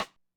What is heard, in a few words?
Rim, drumtraks